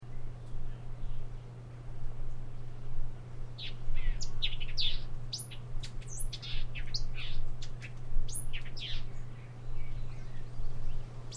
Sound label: Thick billed Vireo, songs